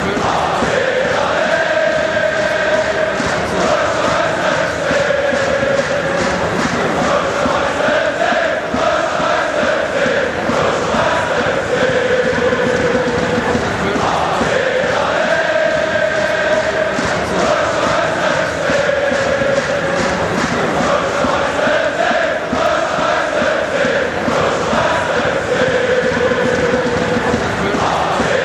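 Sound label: fanchants, football chants; deutscher meister fc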